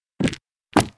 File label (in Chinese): 洗牌, 麻将; 欢乐麻将